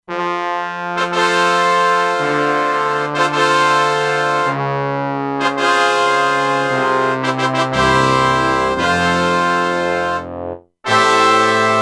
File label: Back Brass; Factory Presets; E-mu Proteus-2